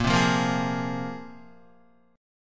Bb 7th Suspended 2nd Bb down Guitar; 4E,4A,5D,5G,5C,6F; Bb7sus2 strummed; JGuitar

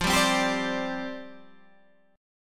C Suspended 2nd Suspended 4th, F

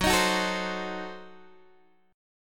Gb Diminished 7th Gb, Guitar Open D; chordshape; down

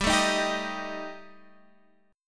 G Suspended 2nd Sharp 5th G

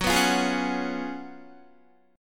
Gb Major 7th Suspended 2nd Suspended 4th, Guitar

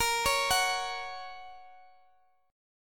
A sharp Minor Sharp 5th, Guitar; x,x,x,20,17,17; arpeggiated; JGuitar

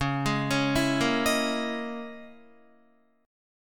Db Minor 6th Add 9th Db down Guitar; Dbm6add9 arpeggiated; JGuitar

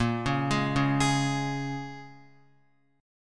Bb-Minor 7th-Bb Guitar; Bbm7 arpeggiated

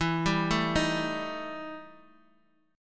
E Minor Major 7th Double Flat 5th E Guitar; arpeggiated; JGuitar

